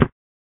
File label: hit ground, footsteps